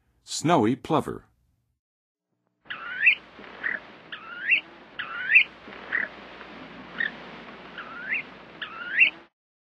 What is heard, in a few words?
Snowy Plover; North America; Western USA; Western USA Songbirds